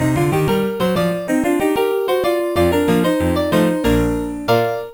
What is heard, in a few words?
jingle; Arcade Snooker